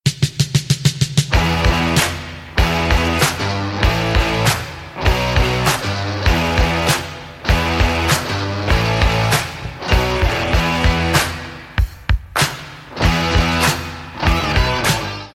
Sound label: Rock N Roll